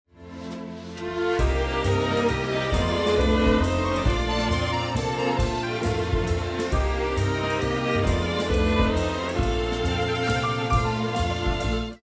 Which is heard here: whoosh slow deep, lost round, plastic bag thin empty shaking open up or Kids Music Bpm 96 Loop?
lost round